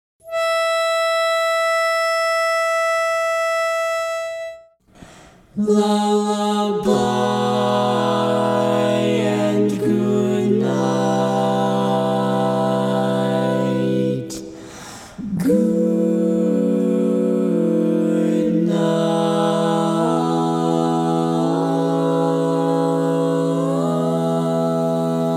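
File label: Female Baritone, barbershop tags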